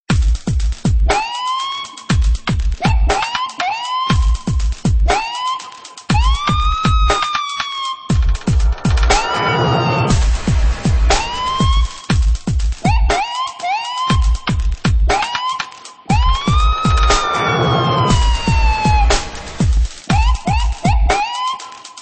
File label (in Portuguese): sirene hip hop, sirene de policia versao hip hop